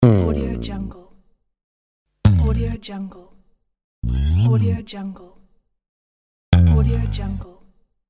bass guitar slides; ambienceworld

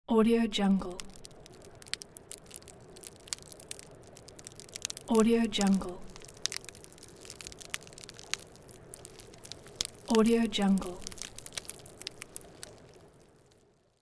fire crackles muted ambience

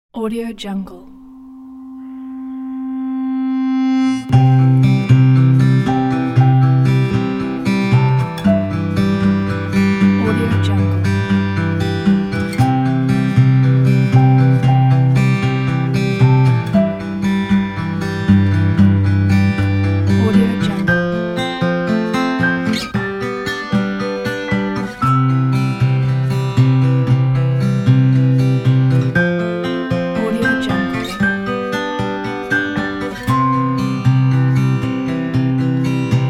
inspiring acoustic upbeat pack

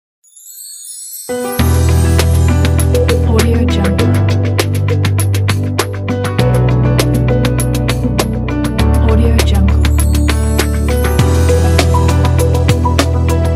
serious documentary logo

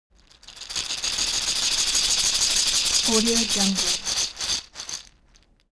object shaker wood rough rattle dynamic

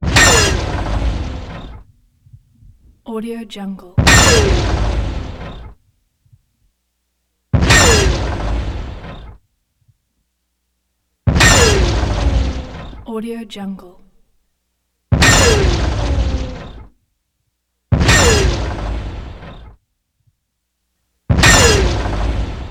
vehicle explosion

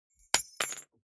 object key key ring small drop parquet hard

